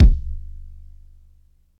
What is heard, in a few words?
rich low end kick sample g key